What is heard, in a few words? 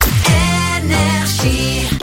Jingle; HYPER ID; NRJ; RAPID 2023